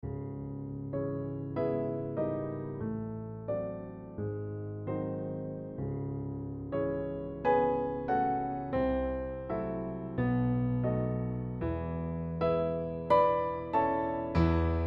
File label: Prelude C Sharp, piano; Piano app by Yokee